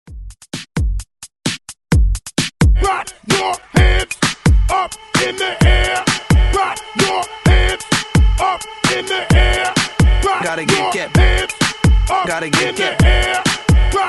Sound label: Hype Re Drum 130 Bpm; DJDOLLS